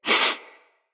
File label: Sniff; Fable Of Garren Rood; Avakot